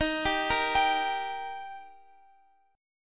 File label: D sharp Major, Guitar, Open F; arpeggiated; JGuitar